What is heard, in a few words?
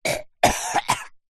Choke Gag